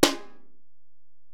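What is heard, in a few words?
Acoustic Snare, Alesis DM-PRO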